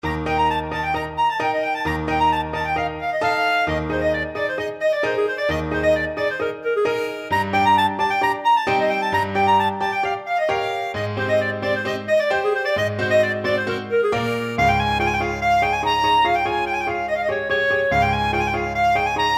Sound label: bartok romanian folkdance clarinet